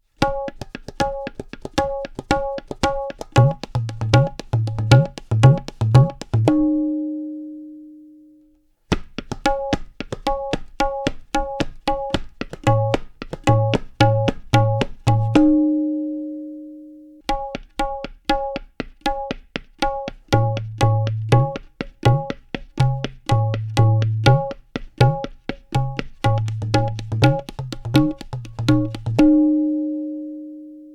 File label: TALA Flamenco Palos